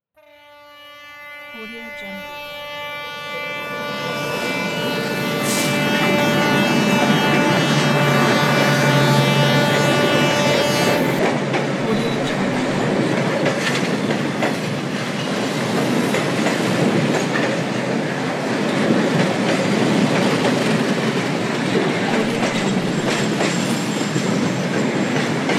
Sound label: train passing by